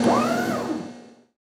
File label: attack; ACCRETIA